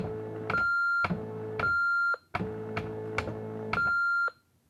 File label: machine office, ambient; nuke